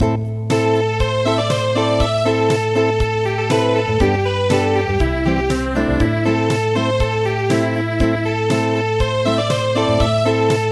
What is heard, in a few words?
Acoustic